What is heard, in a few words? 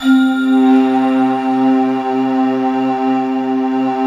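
glockenspiel flute, C3; Best Service; Advanced Media Trax Modern Composer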